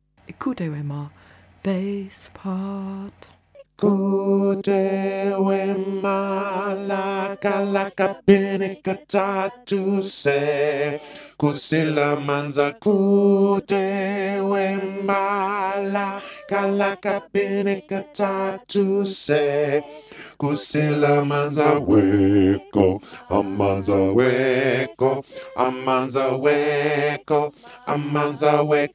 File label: bass